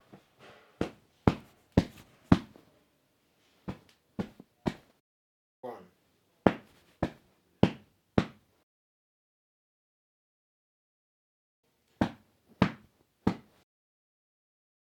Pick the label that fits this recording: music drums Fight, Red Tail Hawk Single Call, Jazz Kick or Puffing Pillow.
Puffing Pillow